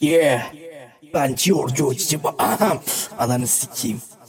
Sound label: rap